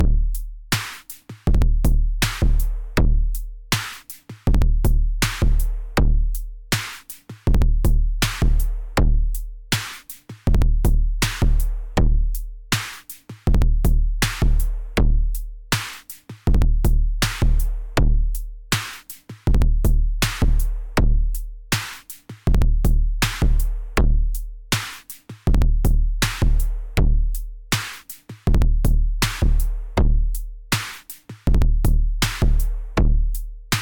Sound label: Microtonic Drum Force